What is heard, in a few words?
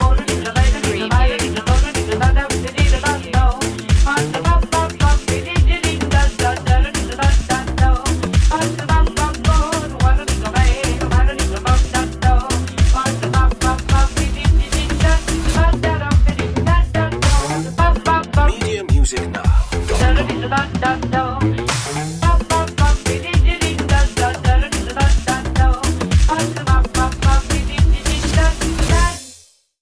funky scat